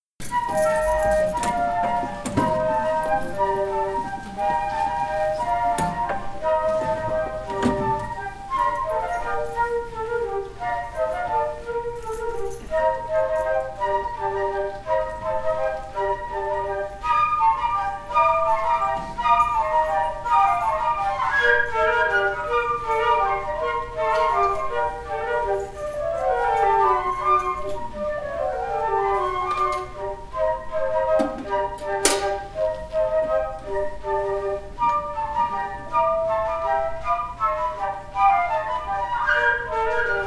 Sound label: flute trio; carol of the bells